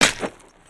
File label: Skullken Walk Run; creatures organic